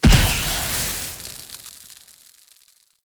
Fire Push Impact; Powers; Fenn

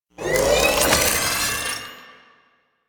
Recharge, Weapons; Warframe; Fulmin Prime

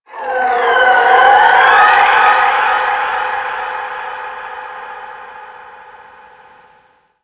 Ocean Dolphin; Warframe; Grineer Ocean

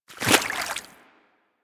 Infested Small Walk Water, Footsteps; Warframe

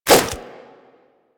Nunchuck End Whoosh E, Infested, Weapons; Warframe

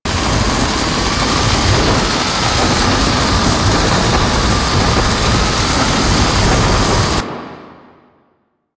Void Dragon Firebreath Beam Loop Lightning; Enemies; Abilities; Warframe; Duviri

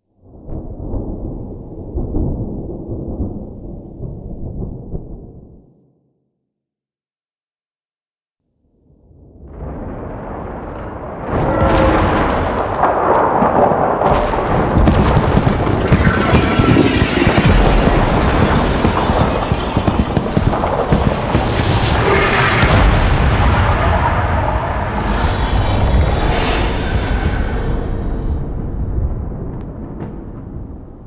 Landing Debris; Warframe; The New War; Cetus Landing

